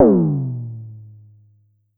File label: Drum X Tom; Pearl Drum-X